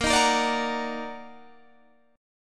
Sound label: D sharp Minor Major 7th A sharp